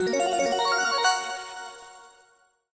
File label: small Win Count Up; fireflies